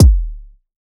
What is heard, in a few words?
kick mash; Hip-Hop; Drum One Shots; SD CAICOS Hip-Hop Sample Pack; Slate